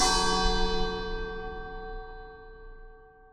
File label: Church Bell